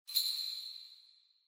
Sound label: Ring Bells Reverb Knock